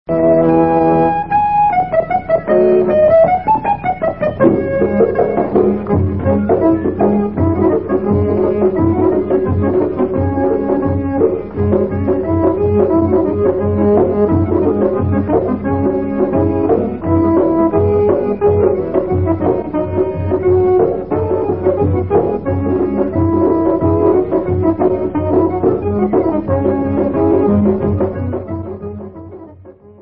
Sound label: Instrumental